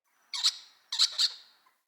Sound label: Squirrel Idle Chatter; Encounters; Animal Rescue